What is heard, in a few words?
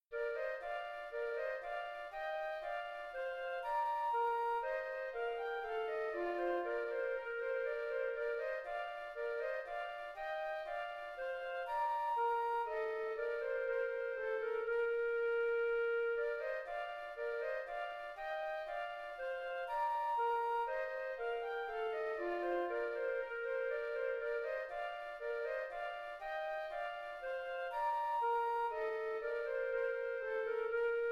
bourree 2 flutes